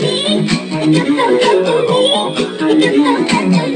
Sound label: Special Sounds Root G# 128 BPM; Vengeance Samples; VEH3 Special Sounds